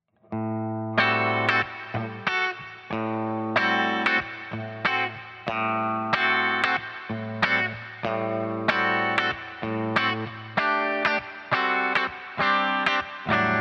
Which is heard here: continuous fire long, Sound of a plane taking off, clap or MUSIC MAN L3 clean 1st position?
MUSIC MAN L3 clean 1st position